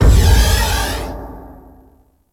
Oro Reactor Piston Move; Warframe; Orokin

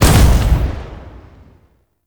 Spear Gun Shot Proj Explosion; Hoplite

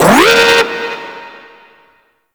Security Camera Alarm; Ambience; Gameplay; Warframe; Grineer; Fortress

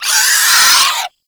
Arm Vocal Wind Up; Enemies; Warframe; ManInTheWall

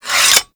Axe Sheath; Warframe; Foley

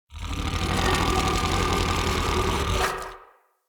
Battle Dog Intimidate Growl, Animals; Vocals; Snc Battle Dog

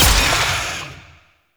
Shot Medium; Weapons; Warframe; Duviri; Incarnon Adapters

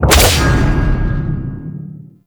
Dash Hit; Monolyst; Warframe; Uriel

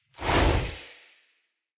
Staff Caster Cast Heavy; Weapons; Player; Staves; Starter

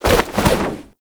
Generic Spin Roll Whoosh; Warframe; Characters

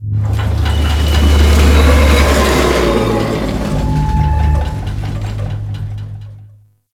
Crate Pass, Ship Props; Corpus Ship

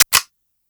UMP Mech, Weapons

